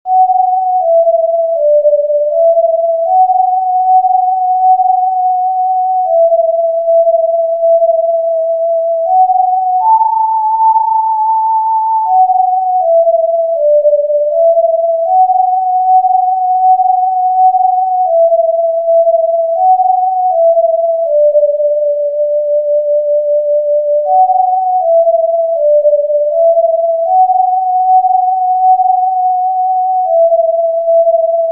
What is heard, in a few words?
Mary Had A Little Lamb TIN-D Tin Whistle